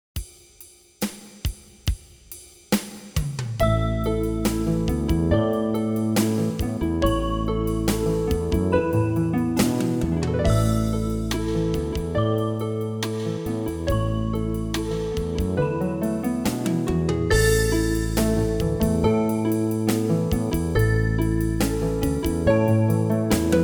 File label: New Age Sounds, piano